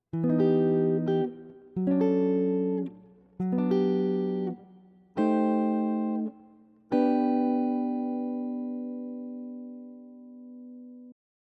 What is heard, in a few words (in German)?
Reharmonisation; gitarrenunterricht